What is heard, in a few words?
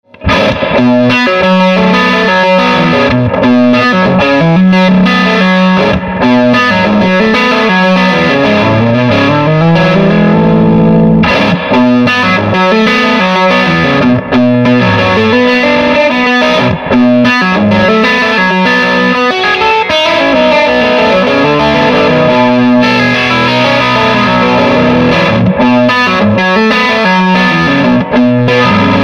SG bridge pu crunch; swart ast pro